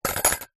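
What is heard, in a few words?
household food mixer hand held detachable whisk place in plastic holder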